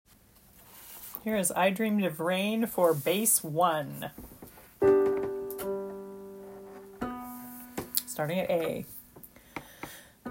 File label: bass